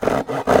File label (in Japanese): nokogiri; easyrpg; Ib